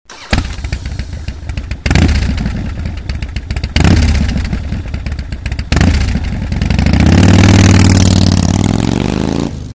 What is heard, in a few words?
bike start